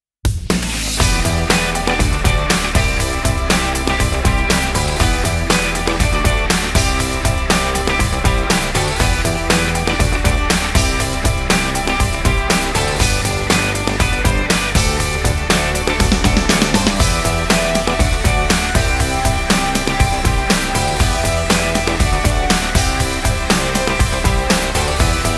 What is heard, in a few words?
Bed; Sitting Duck Music; Good Morning Britain